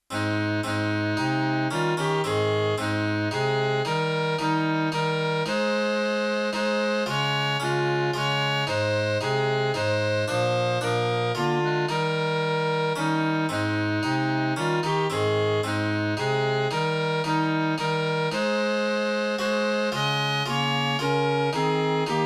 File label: To God be the glory